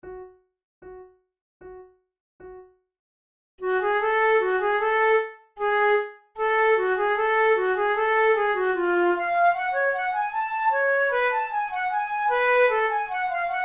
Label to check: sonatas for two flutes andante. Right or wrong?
Right